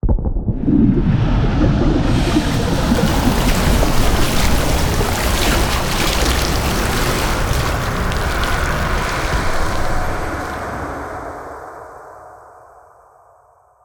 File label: Blacksmith Station Reveal; Level Events; Soulplane